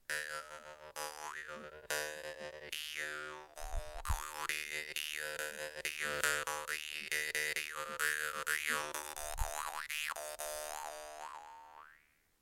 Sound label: danmoi doppel